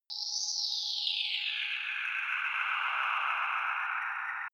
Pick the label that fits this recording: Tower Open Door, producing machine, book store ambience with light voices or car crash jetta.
producing machine